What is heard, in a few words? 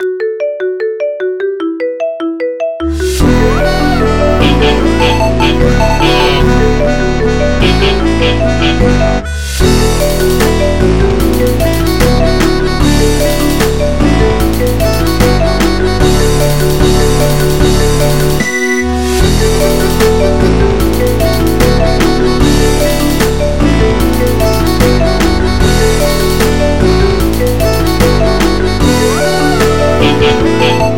Xiaomi Mi Mix 2 Ringtone MIDI